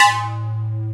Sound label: noise 2, sound noise; NOISE+ALARM